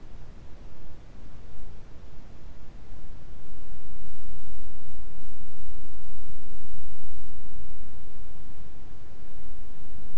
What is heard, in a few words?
brown noise